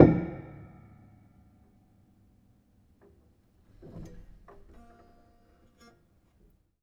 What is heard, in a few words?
Hamm Perc, Piano Hammer Perc; Piano Miscellaneous